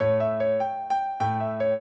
piano; Mozart

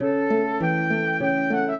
trio, flute harp; Mozart

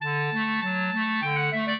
clarinet; Mozart

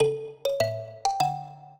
minuet, mbira; Mozart

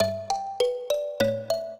trio, mbira; Mozart

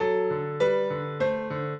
trio, piano; Mozart

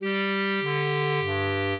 trio, clarinet; Mozart

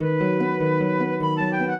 minuet, flute harp; Mozart

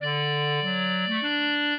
clarinet; Mozart

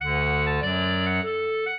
clarinet; Mozart

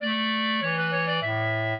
minuet, clarinet; Mozart

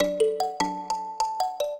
trio, mbira; Mozart

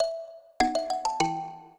minuet, mbira; Mozart

